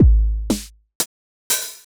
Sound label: drums; orion